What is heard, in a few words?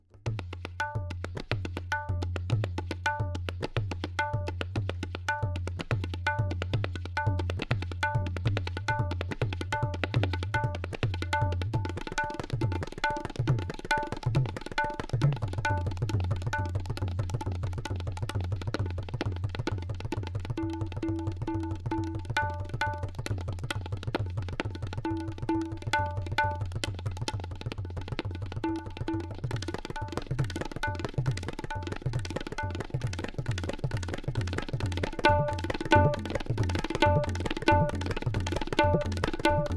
Tabla